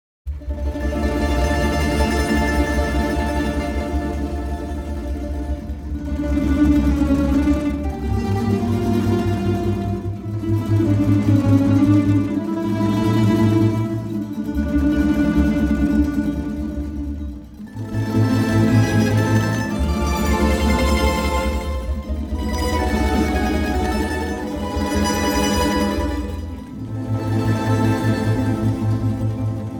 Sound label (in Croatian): tamburaski orkestar, live